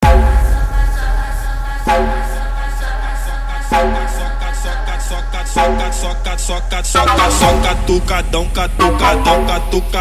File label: Brazilian Phonk